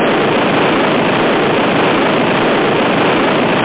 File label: CANNON